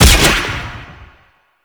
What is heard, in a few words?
Bullet Jump Slash; Warframe; Mods; Parkour